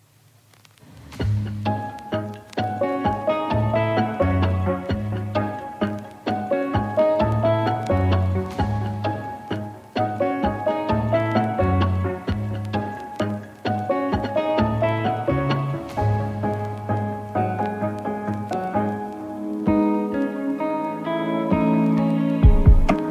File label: lofi